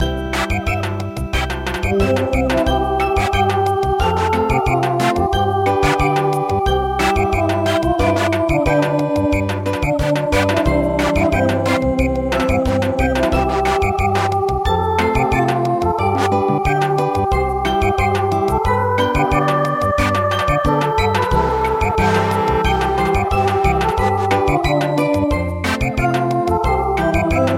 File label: Samba; Thai National Anthem